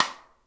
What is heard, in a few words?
SNARE; Sonic Reality; Interactive Drum Kits